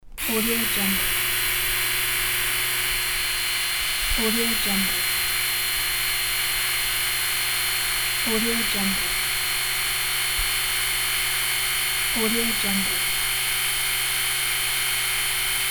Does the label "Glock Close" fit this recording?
No